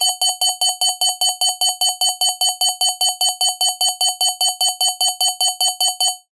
science fiction alarm computer glassy beeps

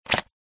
camera dslr lense remove twist canon eos 6d; zapsplat

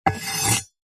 warfare knife metal blade edge scrape wood

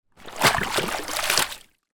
clothes shirt move wash rinse water; zapsplat

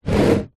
plastic tub slide on top of another short; foley

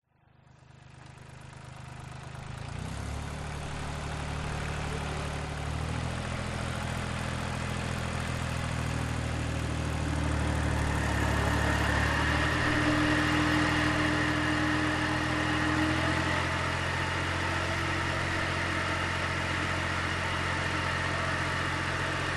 Chain Saw Cutting Woods; west wolf

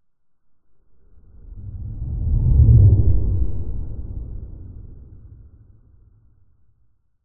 science fiction spacecraft fly past internal pov; zapsplat

